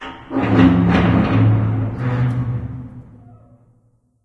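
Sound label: metal randoms impact, ambience; source engine; portal2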